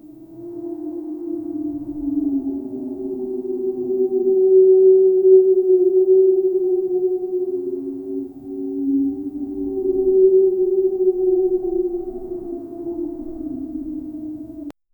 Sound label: creepy haunted, haunted